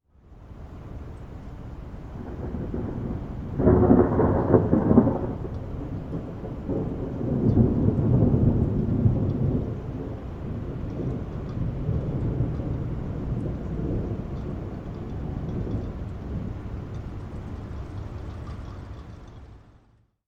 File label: Thunder Far